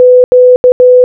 Q Morse Code

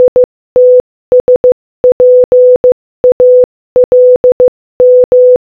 ITS PALM Morse Code

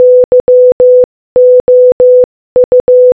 YOU Morse Code Audio

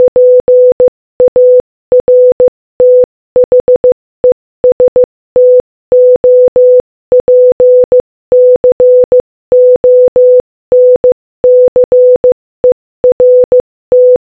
PAR THE STOP CONCERT Morse Code

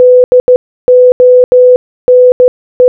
DONE Morse Code

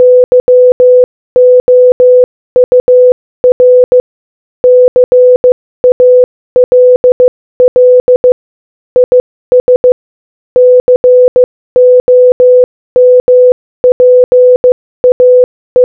Morse Code Audio Speed 15 15

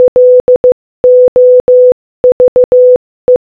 LOVE Morse Code

